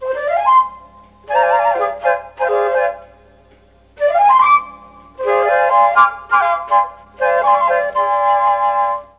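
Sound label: C#4 CHA CHA FLUTE, Mellotron; Mike Pinder - Mellotron Archive CD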